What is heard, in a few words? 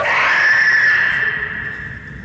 big sharp distant scream; scary